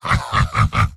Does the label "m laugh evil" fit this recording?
Yes